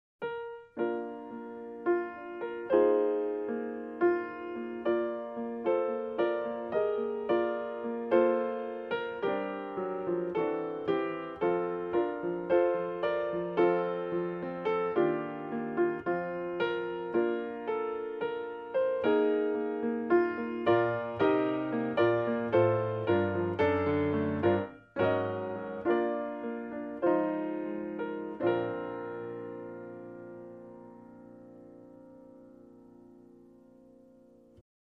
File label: Oh Come All Ye Faithful, Solo Piano